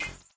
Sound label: Purple Battery Indicator Machine Light On